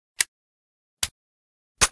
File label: mp5 bore; weapons